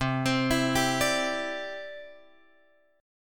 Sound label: C-Major Add 9th, guitar; Cadd9 Chord {8 X 10 9 8 10} arpeggiated